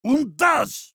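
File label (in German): meleeing, German; Heavy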